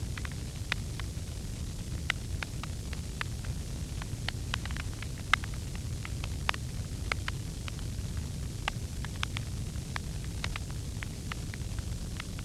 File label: tree fire; Factorio